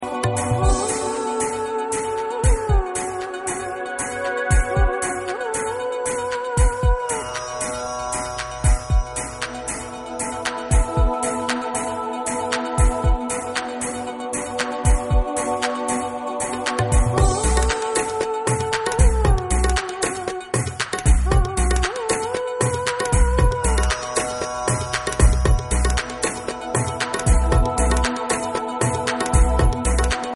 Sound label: Trance